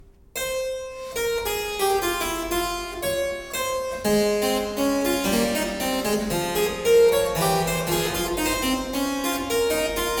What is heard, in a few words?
Harpsichord, Allegro; Handel; Suite 6